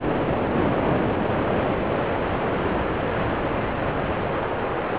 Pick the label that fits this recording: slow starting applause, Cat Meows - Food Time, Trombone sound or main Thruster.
main Thruster